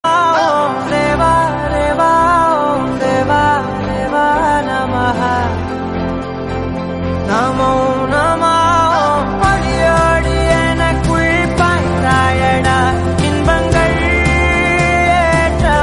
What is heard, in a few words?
song tamil; Brahmastra